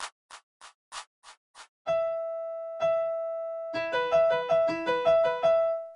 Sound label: Piano Quintuplets 5 3